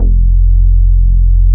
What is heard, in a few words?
JNO MELO F2, MELLOW BS; Drums In Your FACE!